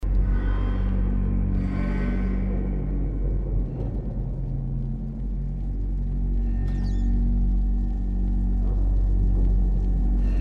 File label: motor; Little Nightmares; gamesoundopedia